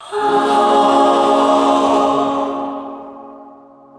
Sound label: breathy choral vocal sigh swell